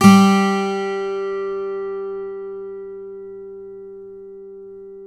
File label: 12 string g2, guitar